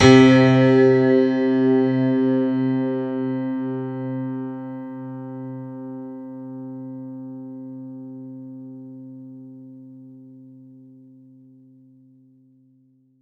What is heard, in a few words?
C3, Synth Line; 90 s Sample CDs; NiceBeats; SynthLine VOL-15